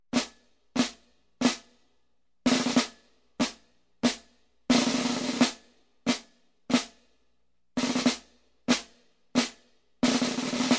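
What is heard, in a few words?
drums military march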